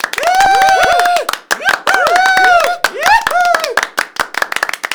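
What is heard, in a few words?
HAND CLAP + CHEER, SMALL CHEER; 1000fx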